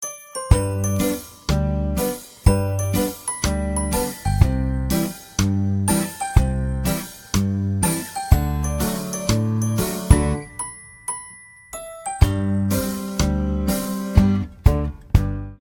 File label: ringtone